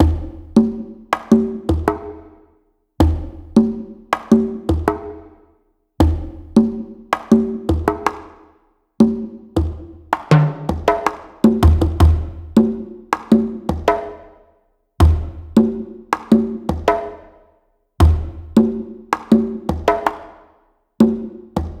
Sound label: Congas - 80BPM; Percussion Kits; STEMS; Ghosthack; SkyRealm; Kit 05 - Fallen Tribes